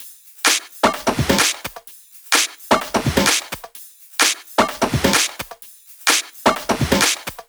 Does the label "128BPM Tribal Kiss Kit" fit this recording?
Yes